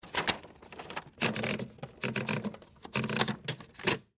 Typewriter Load Paper, Technology, Typewriter, Load, Paper; Smith, Corona; Typing